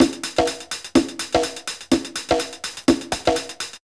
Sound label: hats a conga, Deep House 125bpm